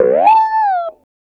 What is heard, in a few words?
Clavinet; Ambient Grooves